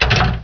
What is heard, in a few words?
door close; heretic ii demo; doors